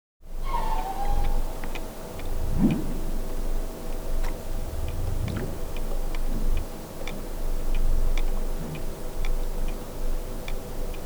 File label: Humpback Whale Vocalizing